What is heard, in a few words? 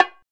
ETHNIC PERC; Roland - LA Composer vol. 1